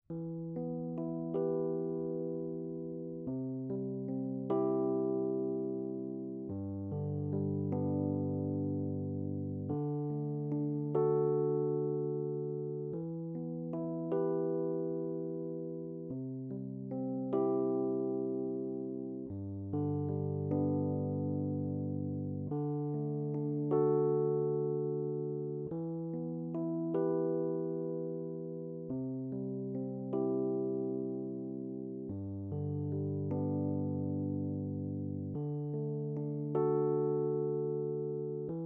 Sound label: rhodes